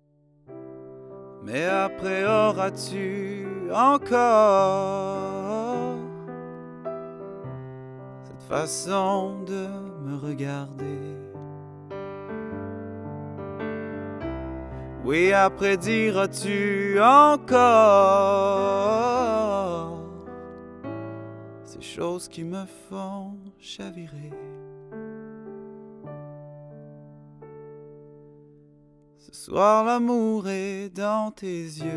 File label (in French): chant fr qc naturel jeune adulte medium